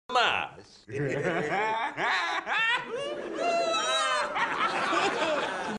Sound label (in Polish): smiech